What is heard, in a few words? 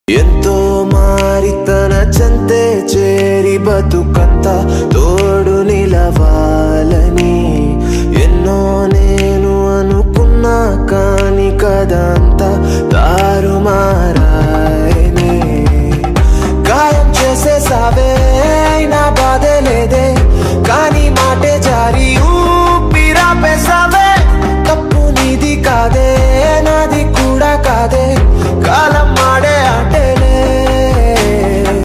telugu love songs